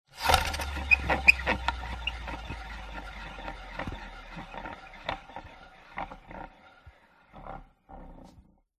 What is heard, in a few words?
wood spinning wheel spin rotate stop squeak; foley; zapsplat